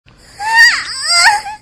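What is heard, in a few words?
die, alien controller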